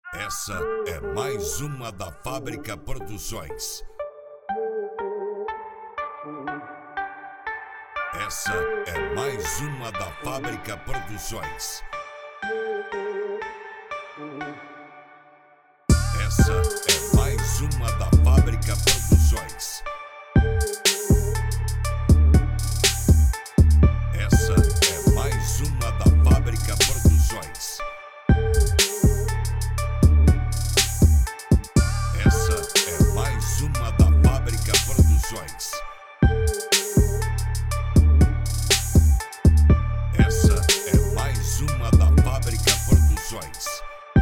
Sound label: beat trap